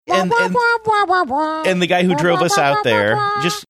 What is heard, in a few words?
singing string of wah wahs